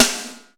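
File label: snare drum rim; East West; Global Sounds; RY30 DRUMS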